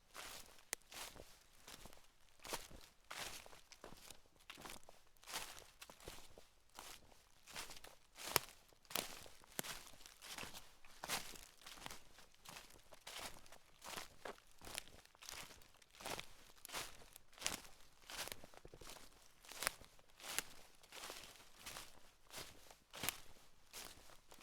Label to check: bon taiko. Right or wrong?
Wrong